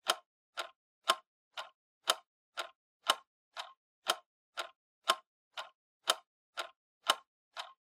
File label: Clock Second Hand Dry Loop